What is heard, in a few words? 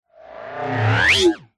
science fiction robot transform metallic mechanical